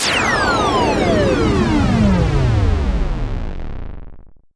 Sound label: FX Sweep Down; vengeance essential club sounds-3